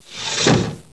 SLICE

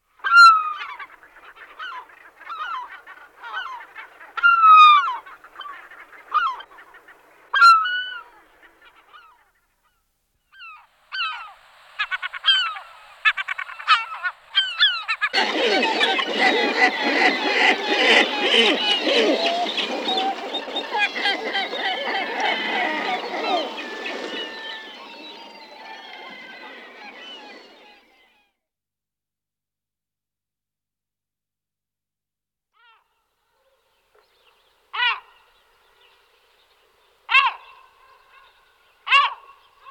Larus argentatus, Larus cachinnans; All the bird songs of Britain and Europe; Jean-Claude Roche

